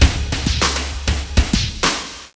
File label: drum fill